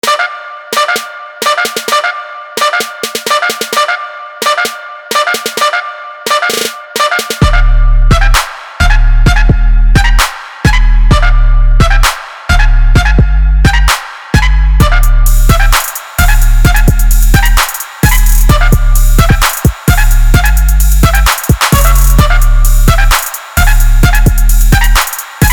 trumpet rap beat

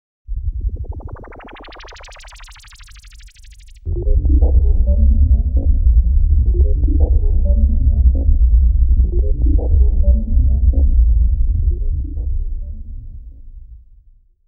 bass bubbles

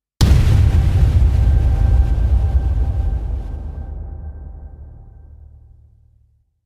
booms nuke

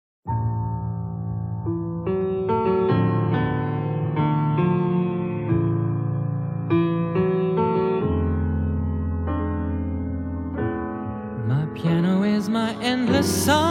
piano song

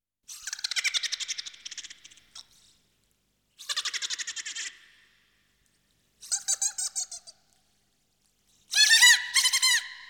laugh

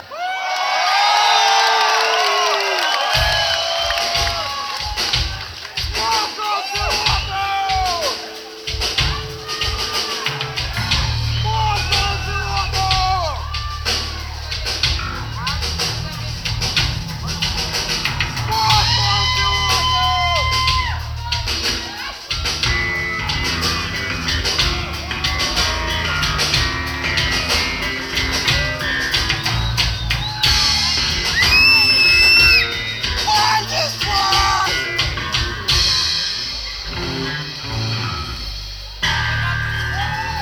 bass solo

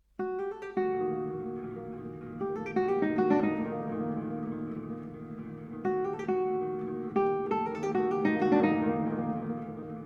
guitar quartet

